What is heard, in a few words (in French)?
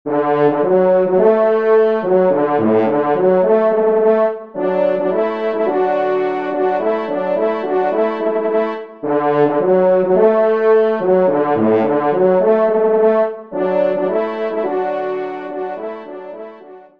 ensemble, trompe cors; Michel Strogoff; Artus